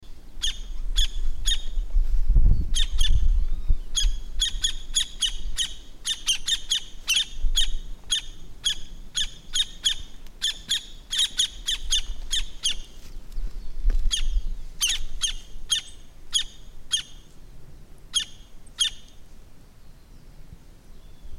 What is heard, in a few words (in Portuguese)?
canto do pica pau do campo